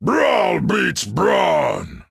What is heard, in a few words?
Orc Taunt